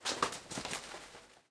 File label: forest, lake